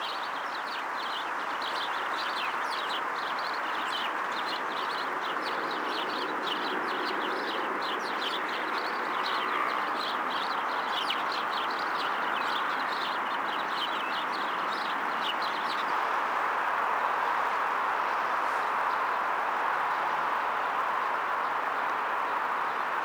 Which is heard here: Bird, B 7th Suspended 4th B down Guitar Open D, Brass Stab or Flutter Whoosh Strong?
Bird